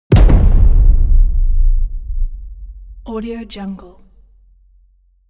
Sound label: cinematic hit drum hit loose large